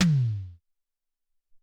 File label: Hex Tom, Alesis SR-18